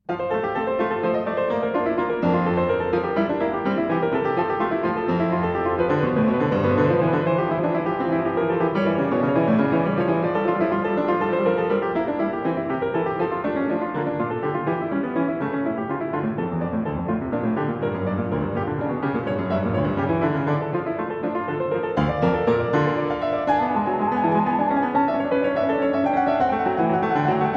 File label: Prelude in F Major; Prelude & Fugue in F Major, BWV 856